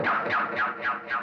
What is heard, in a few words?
jump jacks; a speed racer